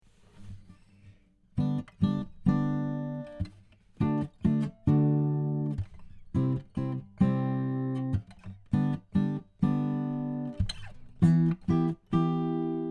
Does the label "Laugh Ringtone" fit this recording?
No